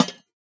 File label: Lantern break; minecraft